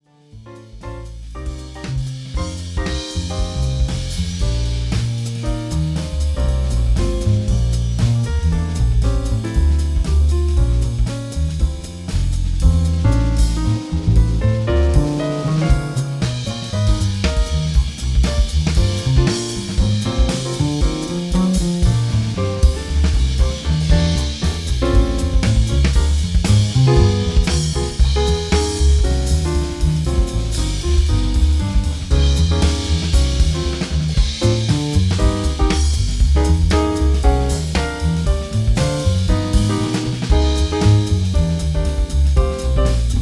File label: jazz trio